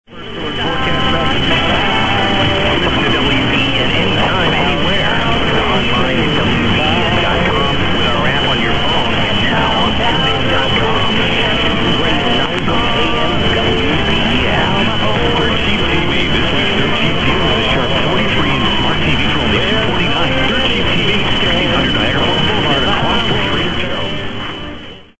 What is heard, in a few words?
930 WBEN USA; sdr Amberg